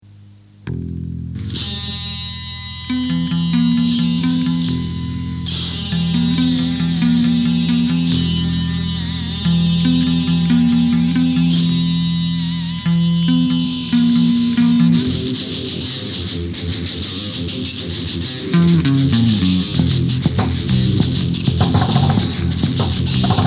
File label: instrumental